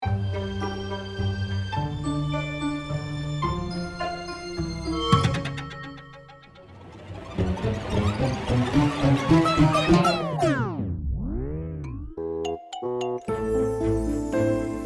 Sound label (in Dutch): Muziek Solo